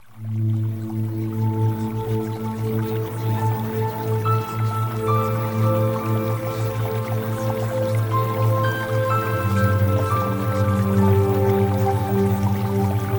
Music Theta Waves

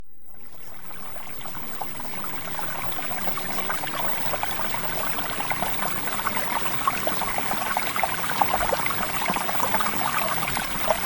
Water

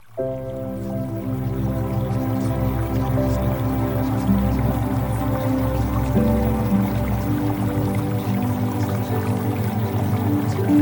Music Theta Waves; Premium Subliminals; Emotional Mastery

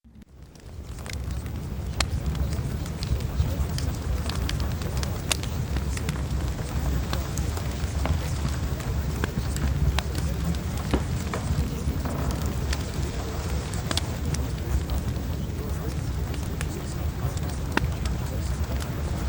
Fireplace; Reality Shifting

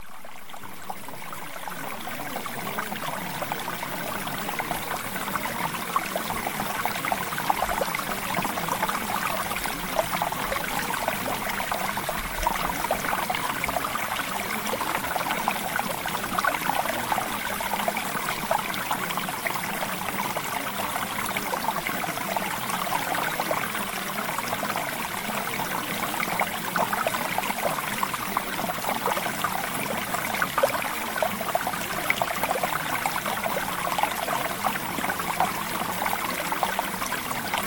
Mountain Stream; Premium Subliminals; Success With Men

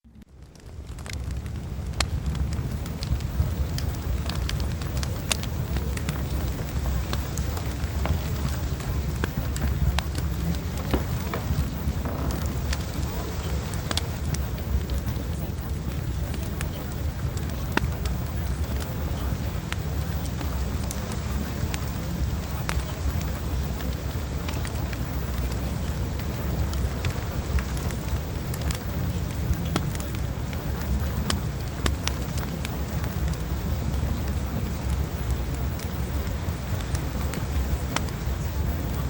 Fireplace

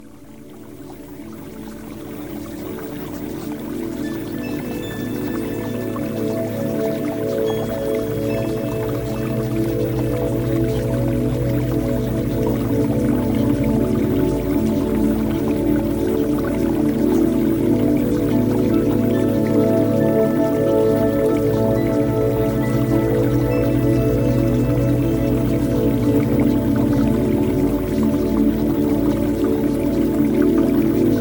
Music; Premium Subliminals

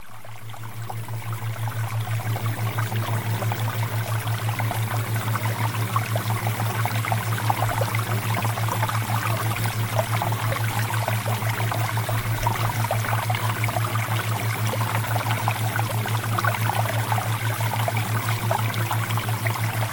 Water Theta Waves; Premium Subliminals

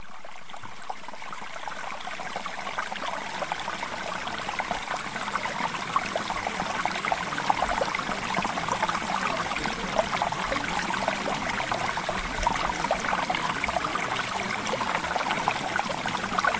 Mountain Stream; Premium Subliminals

